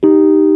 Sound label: Rhodes Chord; Mo' Phatt; Digital Sound Factory Mo' Phatt